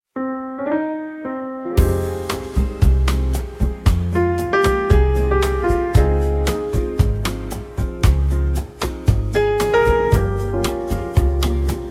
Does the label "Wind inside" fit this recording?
No